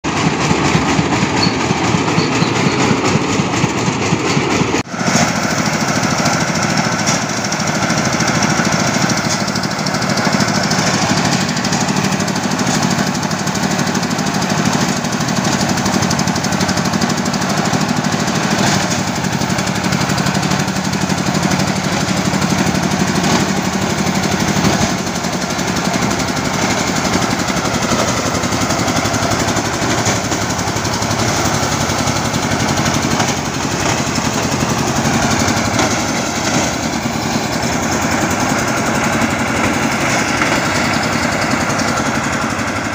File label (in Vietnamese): tieng may tuot lua thoi thoc